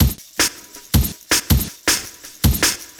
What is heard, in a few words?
Beat, Futurist Drum & Bass; Drums & Percussion